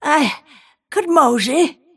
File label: move pain; snapfire